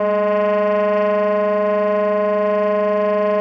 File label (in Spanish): Tremolos